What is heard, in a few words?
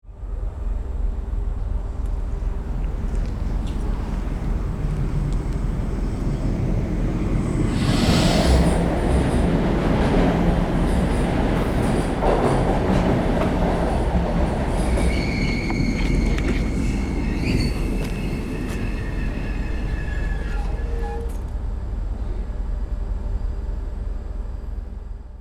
Suburban train station train arrival close perspective